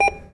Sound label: beep short, atm